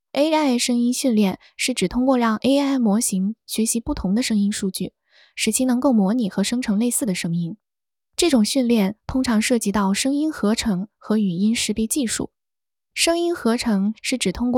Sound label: haha